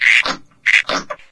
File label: antlion grub alert